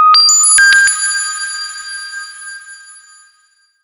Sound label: SHORT, SHORTNOIS; USB Soundscan vol.14 - Special FX Twisted